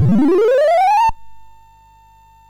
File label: sid, MOS6581R2; Donkey Kong 1983